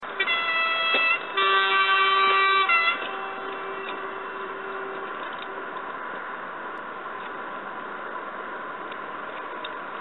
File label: Eurosprinter horn